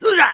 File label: Monk Shout; Playable Tutorial Level; TR2